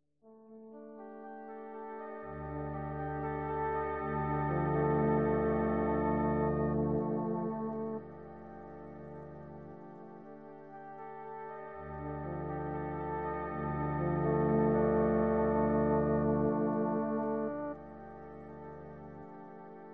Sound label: flute recorder bell partials; fractal music; Sloth canons